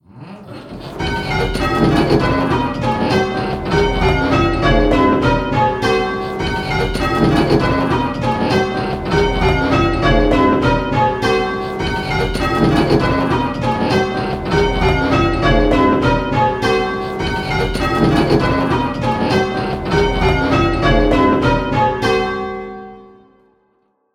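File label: Bells ringing, there is some 'Treble Trouble'; Can you advise as to what the problem is?; Pebworth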